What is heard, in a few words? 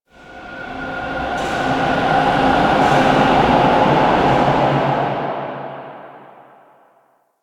Subway Misc; Nineteen Ninety Nine